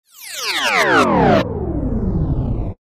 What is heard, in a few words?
Descend Short Electronic Splintering Descend with Reverb